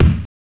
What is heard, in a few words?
Kick, Genesis